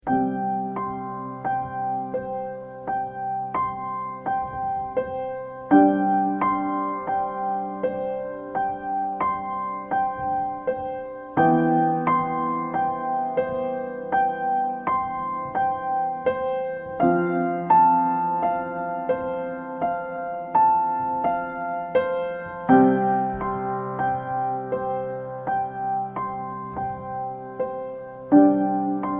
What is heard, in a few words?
Piano Theme